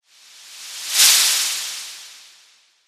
motion; animal

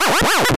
absorb

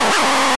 dragon

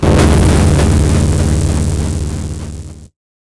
explosion

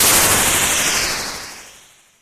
beam

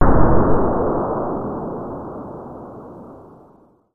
blast

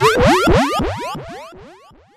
fall

